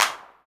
clap, 135 BPM, drum & bass; Ueberschall; Drum & Bass Flash Trackz

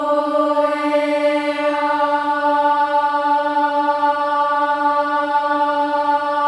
OH EH; Spectrasonics

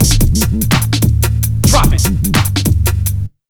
AMBIENT 147, Big Beat Drumloops

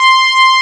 PWM HP20 C5; Analogon

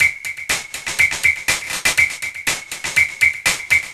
Drum Fx Loop, Electronica

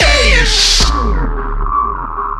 vox FX; Big Beat Samples & More

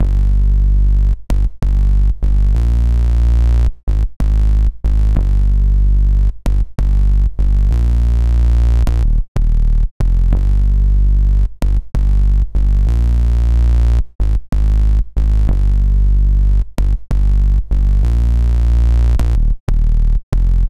Fuzz Bass; Zero-G Smoke

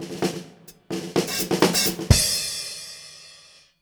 Fills, Monster Beats; Zero-G

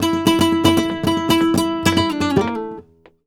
Flamenco Guitar Remates De Pulgar, a

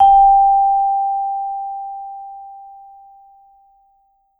G4, Vibes; Sampleheads - Dave Samuels Marimba & Vibes

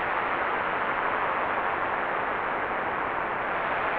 sparse soundscape samples; ReLooped

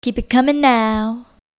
Vocal, Infinite Soul

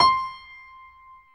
PIA PRO, Roland

